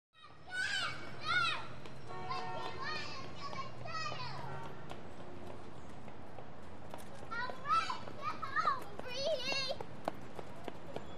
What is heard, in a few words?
Two Little Boys Play, kids play